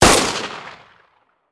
Hand Gun; Sound Ideas; Guns

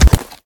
wall tree; firefight

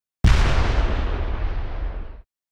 explosion; NCH Library

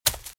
Footsteps Leaves Sprint Right; RadwinIsland

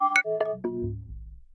friend disconnect; Quentin C's Gameroom

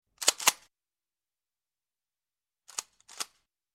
Gun, Shot Gun 12 Gauge Cocking; Sound Ideas 2000 Series

